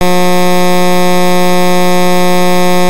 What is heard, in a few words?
buzz; TEACH